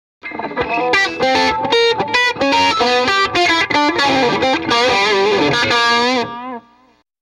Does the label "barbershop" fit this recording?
No